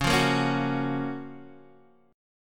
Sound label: F sharp Major 7th